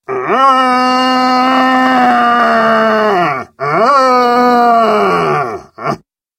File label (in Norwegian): Voksen hjort markerer revir